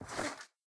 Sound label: jump Sound